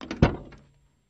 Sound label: Cutlass Door Open